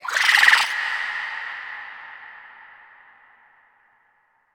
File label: Scream